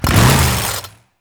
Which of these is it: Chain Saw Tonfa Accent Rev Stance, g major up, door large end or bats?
Chain Saw Tonfa Accent Rev Stance